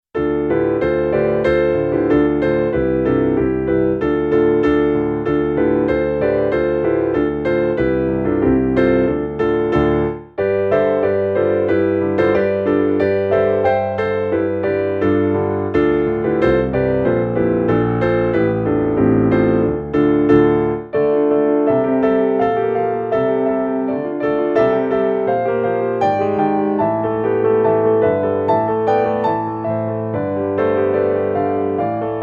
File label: Etude Scherzando; klavirni skladby